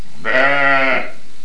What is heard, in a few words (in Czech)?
ovce